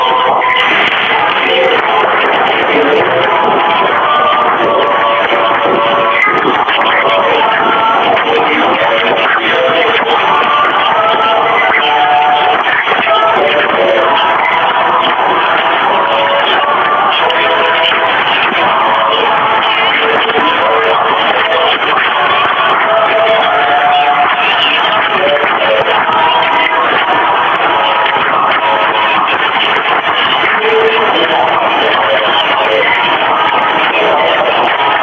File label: choral National Anthem via Radio 846 kHz; Kiribati